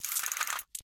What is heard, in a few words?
Cartridge Dismantling Bolt; Game rip; MiSide